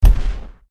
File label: hit